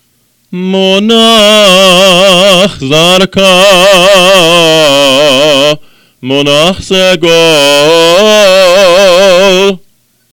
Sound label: haftarah trope zarka segol